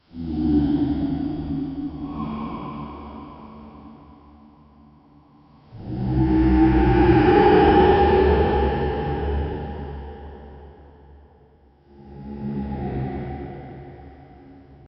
horror demon dark

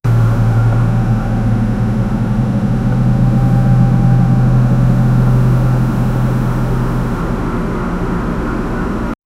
transit

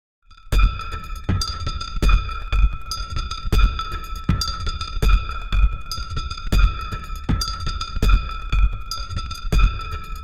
horror indian percussion

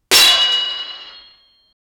loud battle axe swing hit; weapons

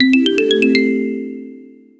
a short lively jingle wit, jingle

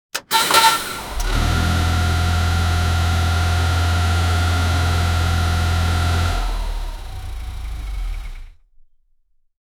vehicles

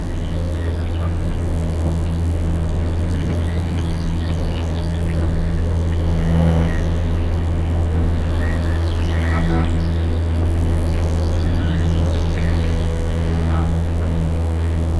sea with a boat

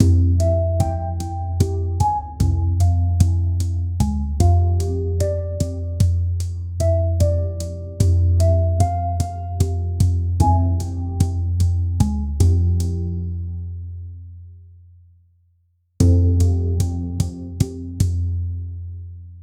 hang drum melody in F, meditation

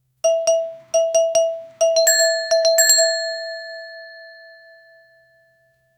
very short traditional india

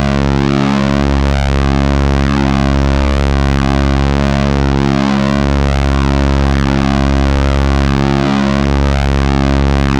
saw

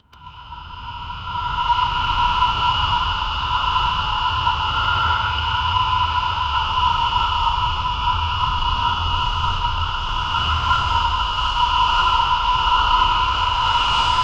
super scary soft sounding, static

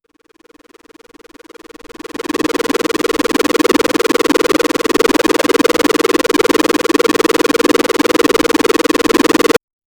16 bit retro fantasy rpg

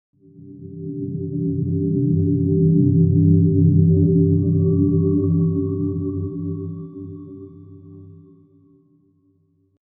deep soft buddhist zen

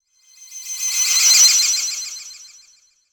anime airy sound, telepor

